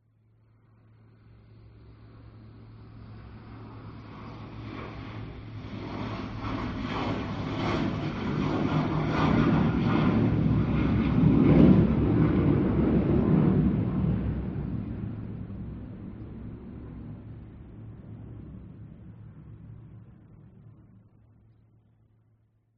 vehicles airplanes military f15 or f16 fly by distance